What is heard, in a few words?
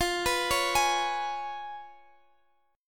Db 7th F